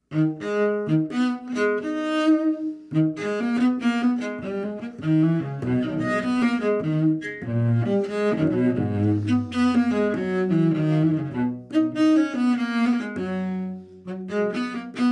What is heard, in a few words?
Contrabass; Assassin's Creed Syndicate